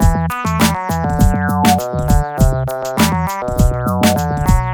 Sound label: ringtone, hiphop rnb; Google; NewWave Labs